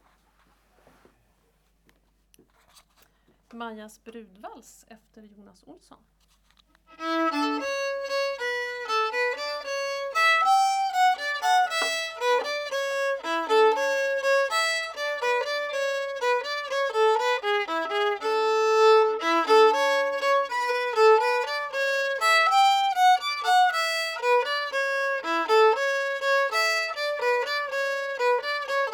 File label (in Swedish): Majas Brudvals